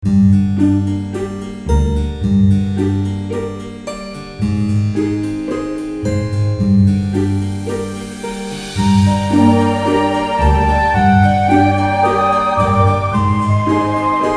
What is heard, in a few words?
Checkpoint; Dracula Twins